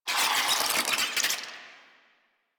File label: Infested Ashen Vocal Attack Death; Warframe